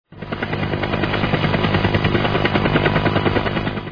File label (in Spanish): helicoptero